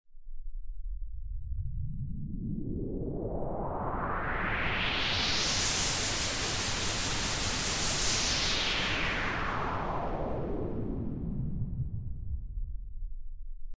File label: Pink Noise Effix Delayed; Techno